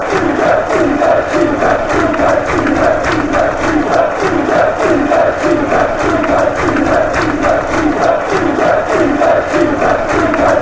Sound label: easy fanchants